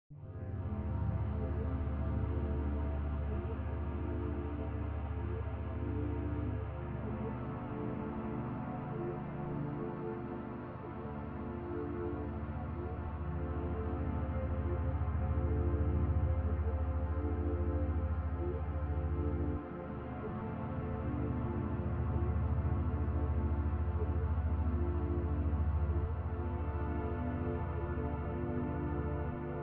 deep space ambient horror style soundscape

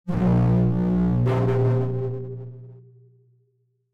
warning

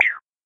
interact

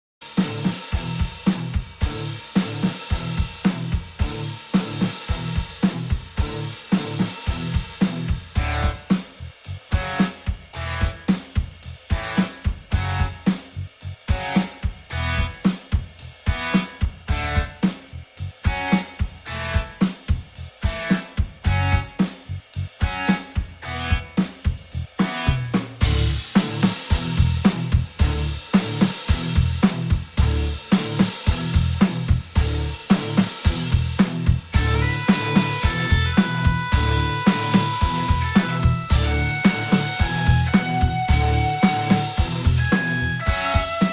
punk rock metal heavy badass; background music; w4ck

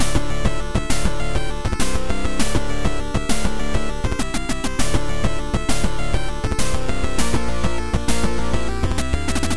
boss battle 8 retro opening

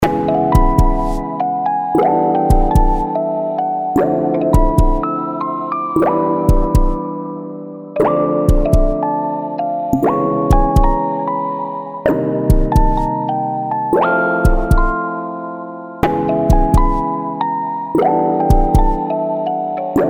jazz synth

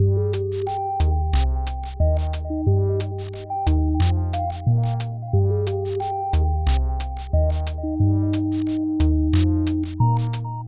game finish

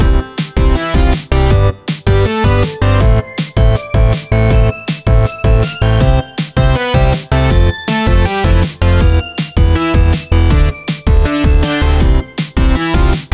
Christmas synths

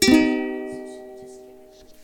forest end, Ukulele Forest; Ukulele Forest beginning loop and end

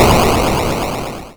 atari boom

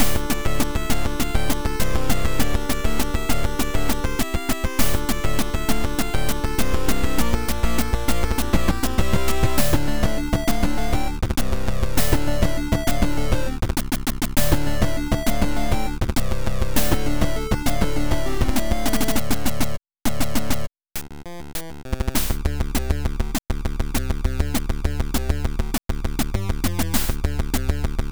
boss battle 8 retro loop

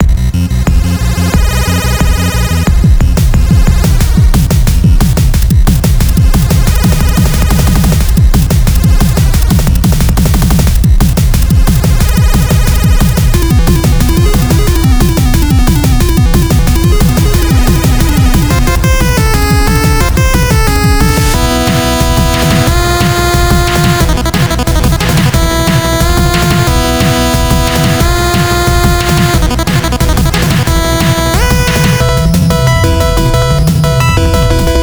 egyptian fortress boss

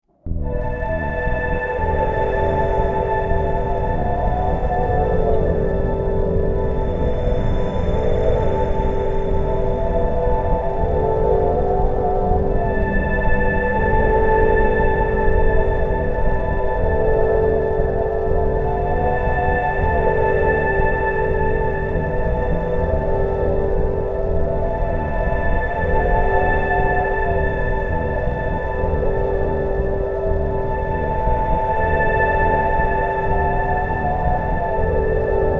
music level

